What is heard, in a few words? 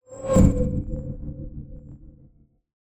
WHOOSH Digital; Cielonos